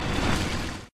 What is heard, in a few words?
Burn body